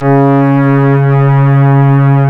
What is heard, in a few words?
C#, strings; Big Fish Audio